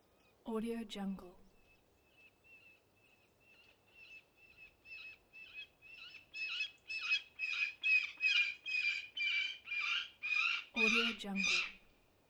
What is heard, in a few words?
peregrine falcon approach